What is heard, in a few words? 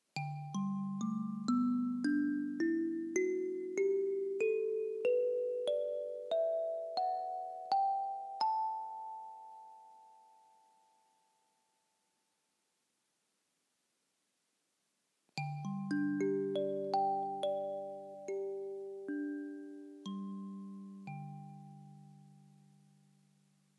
D Major Mbira